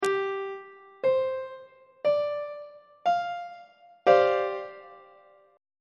f double sharp dominant 7th suspended 4th chord on treble clef